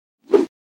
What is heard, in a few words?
whoosh swish; NCH Library